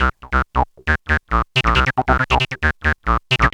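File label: sequence tekno; AMG; Now CD-ROM (Roland); Trance; G.T.M. SEQ 2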